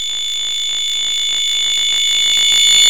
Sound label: Charge Laser; 3DShooting